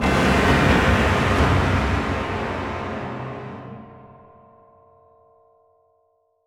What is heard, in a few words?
Music stings Sting